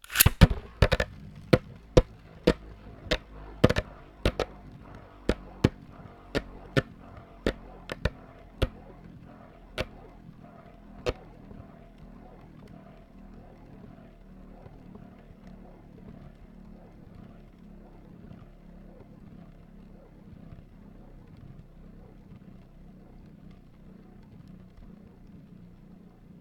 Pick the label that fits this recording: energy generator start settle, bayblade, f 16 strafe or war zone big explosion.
bayblade